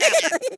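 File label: imp attack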